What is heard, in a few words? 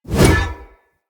Great Sword Whoosh Air; Weapons; Swords; Dendrit Ara Great Sword